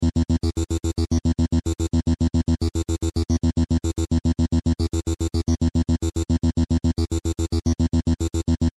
synth bass

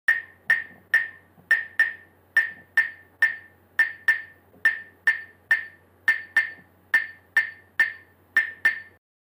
claves

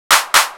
Clap

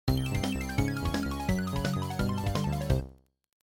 gameboy

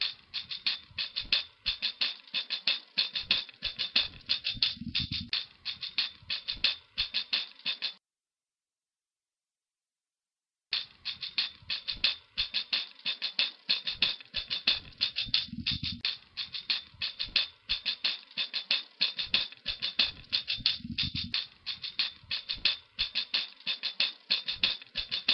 tambourine

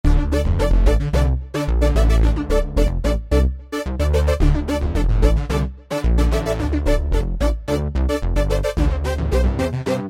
synthline

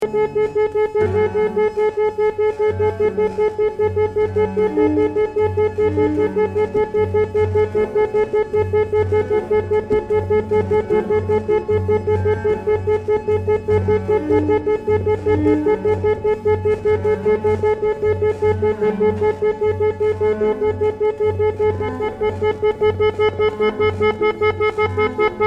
keys

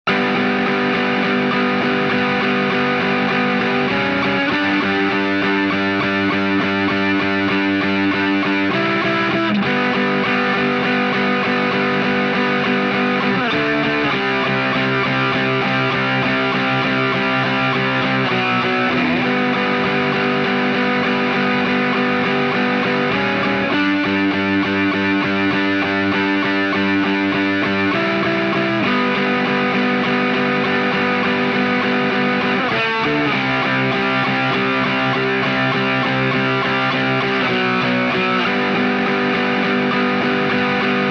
Guitar Right

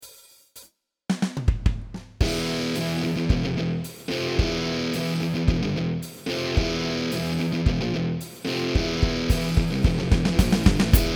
Riff Gtr + Drums

